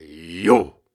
Grunts and Chants, Viking Vocal

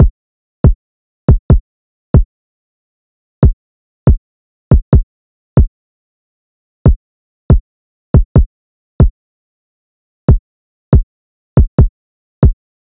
Drum Loop - 140 BPM Kick; Loop Stems; Cymatics; DOPE DRUMS VOL. 1; Wanted

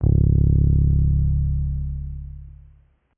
Bass Guitar C#; Basses; Cymatics; Eternity Sample Pack

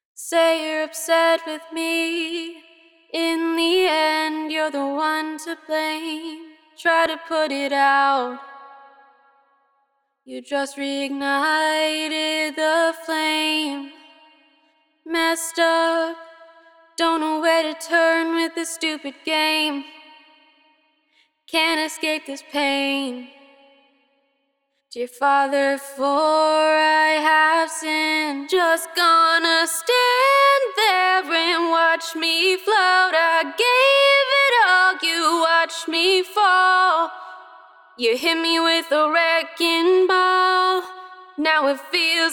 Main Vocal 130bpm E Major WET, Acapella; Advent Calendar - Day 1

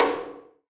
Percussion; Drum One Shots; Cymatics; Light Free Sample Pack; Bonus Samples

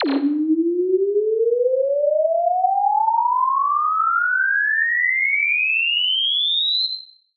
Sine Riser 140 BPM; FX; Cymatics; Light Free Sample Pack; Bonus Samples

